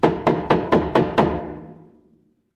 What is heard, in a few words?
door knock